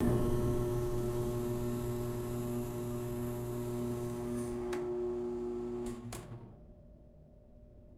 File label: electrical stove heating element hum and ticking; electricity